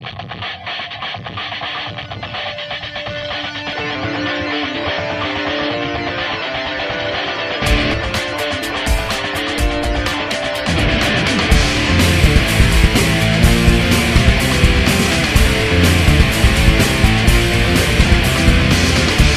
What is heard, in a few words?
Rocking Music 110